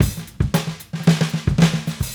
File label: Raw Funk Drums Fill; Sonic Foundry (Sony Creative Software); What it Is! 70s Analog Funk